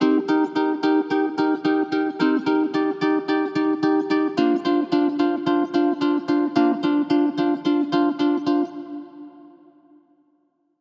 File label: Chords Rhythm Gospel; midi wizard